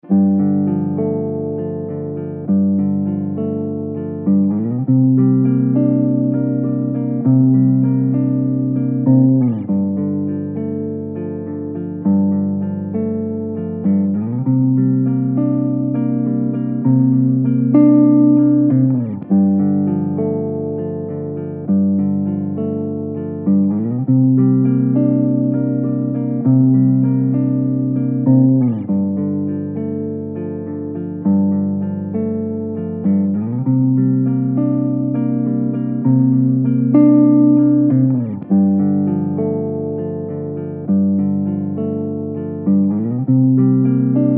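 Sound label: Gm 100 guitar impro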